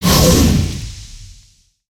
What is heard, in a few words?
Bug Sprayer Spray